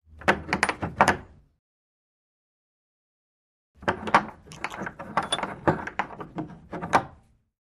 Padlock Metal Hooks, Padlock is locked and unlocked with key return.; Padlock, Metal Hooks x2